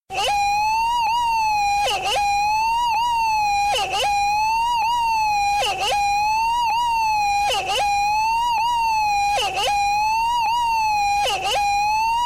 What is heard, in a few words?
siren